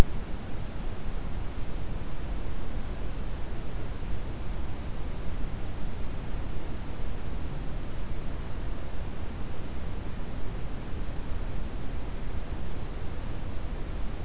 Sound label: noise processed